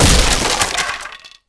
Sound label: crate